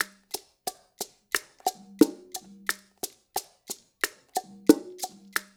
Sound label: LOOP, Percussion, BOLERO; InVision Interactive; Percussion Slam